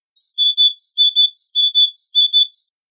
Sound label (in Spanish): primavera carbonero herrerillo